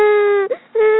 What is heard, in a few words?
baby cry crying